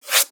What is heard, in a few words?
Sweep, Foley Percussions; Dubstep; Ghosthack; Dubstep Sound Arsenal